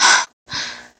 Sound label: Breath; Lewd SFX